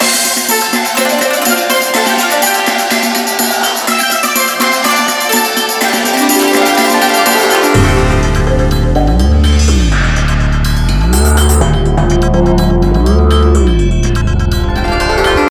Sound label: Atmos; fast furious supercars; arcade